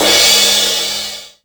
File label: Linn LM2 Crash